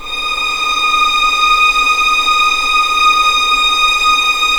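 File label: D#6 legato violin, left, The Ultimate String Collection; East West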